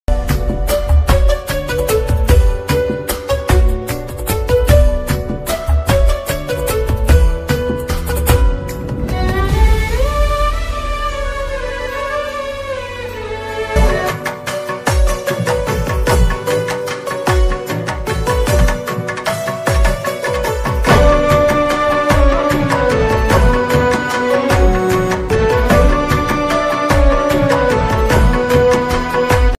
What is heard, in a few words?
kannada movie mobile ringtone love bgm